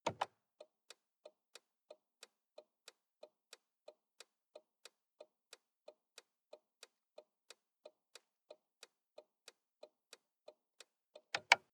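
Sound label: sports car interior blinker long; verkehr transport; autos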